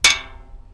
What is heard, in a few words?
metal; Mafie 1